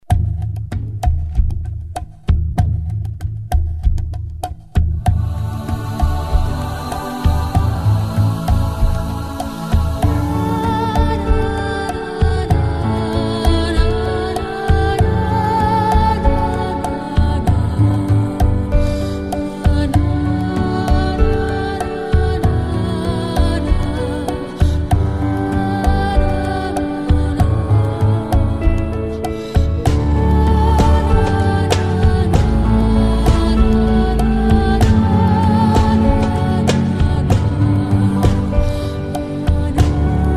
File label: Ballroom Rumba